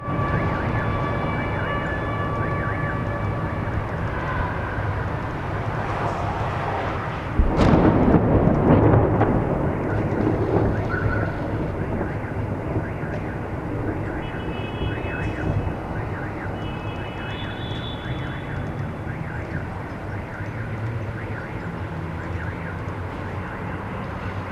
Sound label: incoming missile and explosion